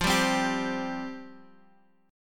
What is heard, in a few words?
F Suspended 2nd, down